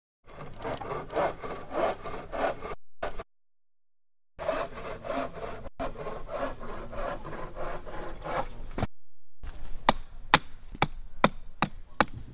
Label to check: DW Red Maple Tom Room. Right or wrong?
Wrong